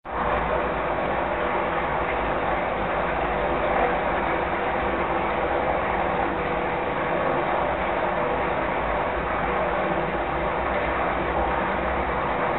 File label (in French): ermitage chute source